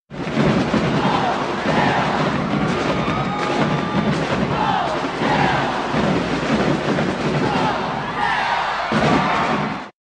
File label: cheer